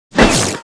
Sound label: ATTACK